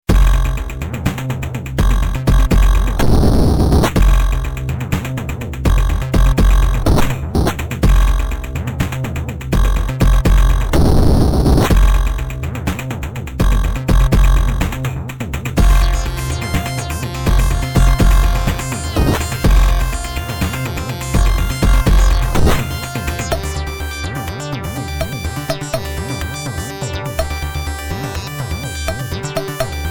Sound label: Dist Beat Pattern; Venom